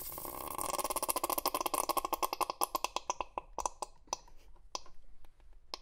squeeze toothpaste

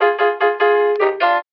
piano, Loops; Phonky

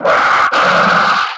breath

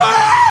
scream male

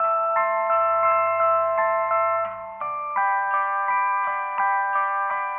sad clown, Electronic Loops

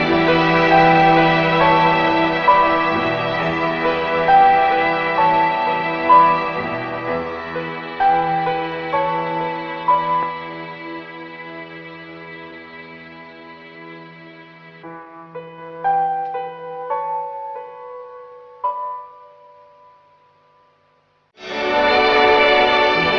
piano slowly going down, Sad; Loops

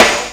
cement, Snares Knocks

